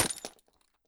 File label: grenade Bounce; Weapon Package FortWars